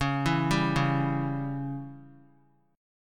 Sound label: C sharp Diminished C sharp down 3C 3F 3A 3D; C#dim arpeggiated; JGuitar